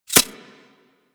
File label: Sentient Projectile By; Warframe; Sentients; Symbilyst; Weapons